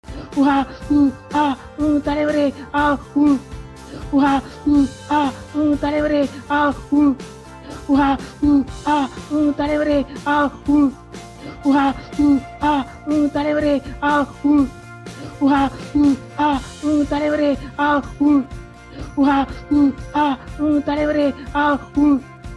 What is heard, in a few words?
moaning bgm ringtone movie bgm; Vikram movie bgm; GP Muthu